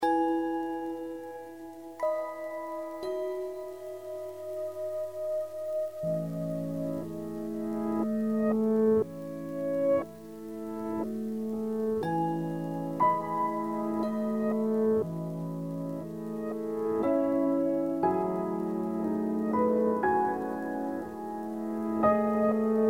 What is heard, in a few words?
Lonely Bitter Sweet Piano Mild Electronics